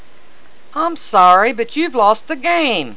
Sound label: lose; fheuchre